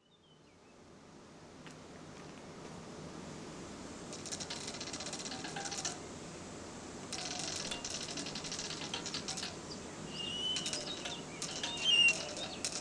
sirènes, extrait des performances improvisées; Deux pistes jouées ensemble : le violoncelliste (Alessandro De Cecco)

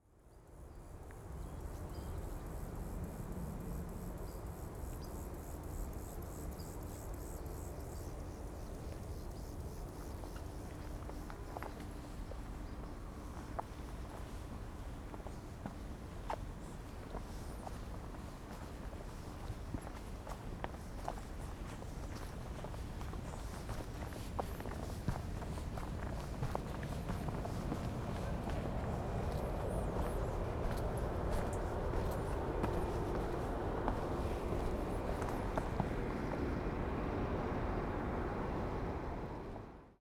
Jarrie effet balcon, Colline de la croix de la vue : un effet de balcon sur les sonorités de l'usine

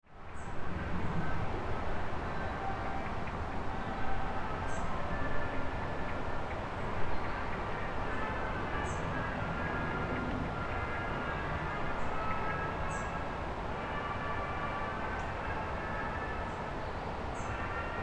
papeteries jazz, À côté du canal des 120 Toises; À côté du canal des 120 Toises, derrière la CCAS des industries électriques et gazières

